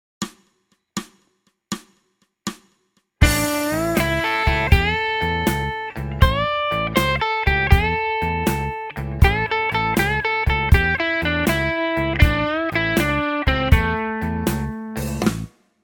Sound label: blues